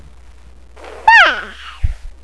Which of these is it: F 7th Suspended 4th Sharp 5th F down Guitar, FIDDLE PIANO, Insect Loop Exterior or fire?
fire